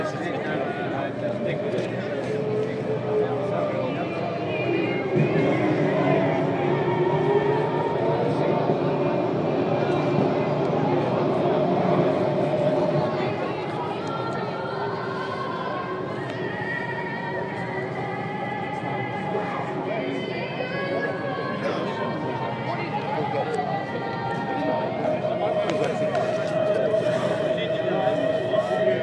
Australian National Anthem at AusGP, Anticipation building